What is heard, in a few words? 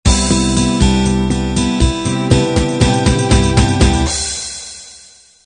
standard 8 beat intro